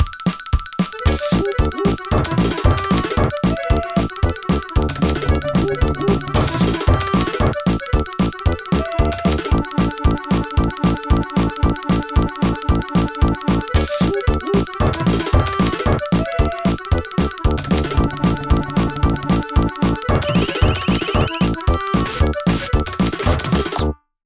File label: Food Throwing